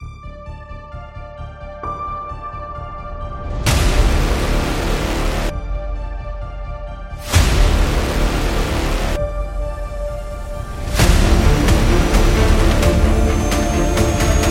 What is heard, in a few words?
Classic Epic